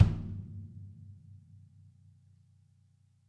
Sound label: kick, acoustic kit